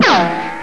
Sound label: m1 bullet ricochet